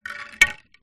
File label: boat wooden oar old down on hard ground